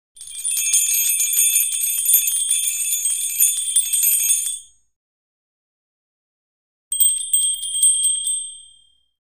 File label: Jumbled Bells; India